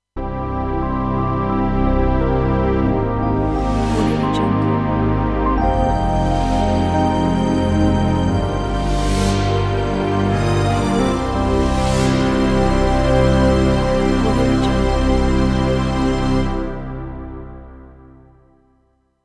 nature ident; simonleng

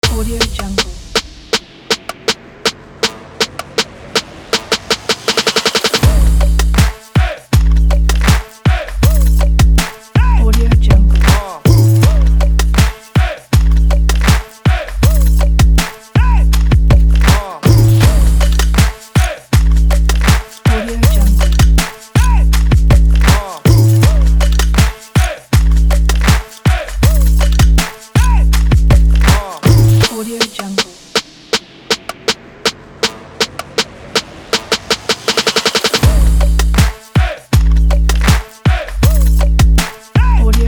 hip hop dynamic

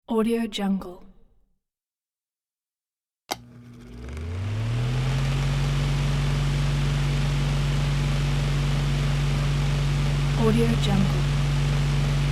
portable fan

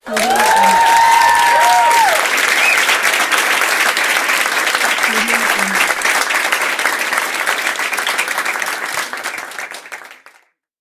applause

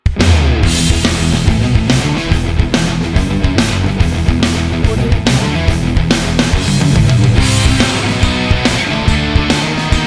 classic hard rock logo

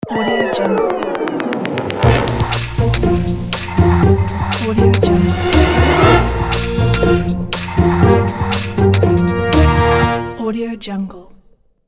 comedy logo; smartsounds

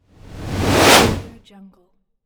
whoosh; dynamic sound

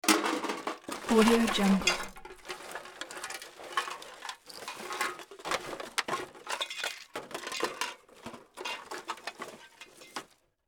search through garbage or junk pile; hollywoodedge

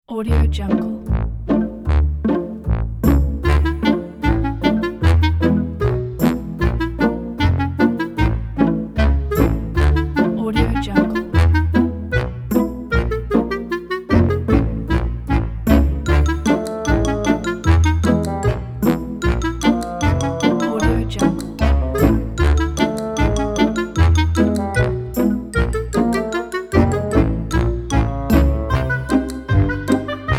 amusingly

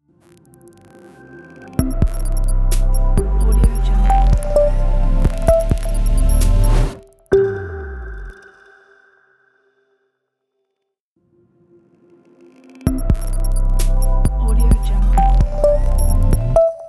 smooth elegant logo

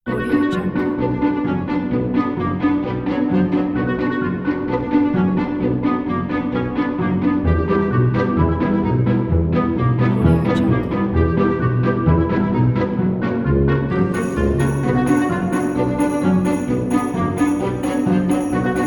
this is comedy music; garagesound

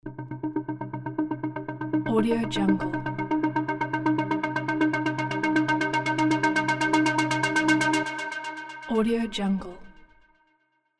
minor riser uplifter; blackalexstudio

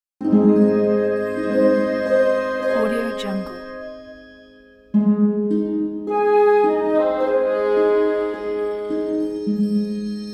romantic cinematic fairytale

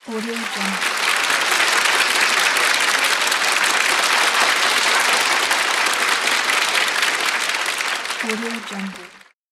a theater applause

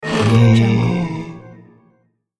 coffin uncovers vampire ghost

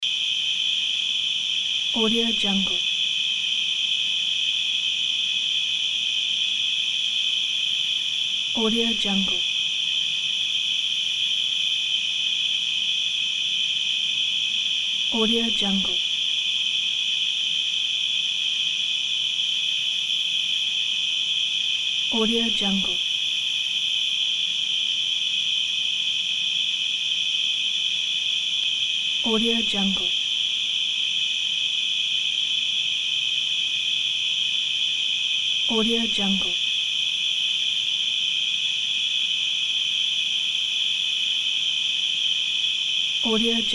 crickets night ambience loop; fascinatedsound

